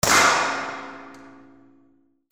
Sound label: WEAPONS